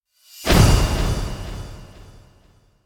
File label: Impact Heavy Thin Metal Impact - Echo; Hollywood Edge; sound designer's toolkit v2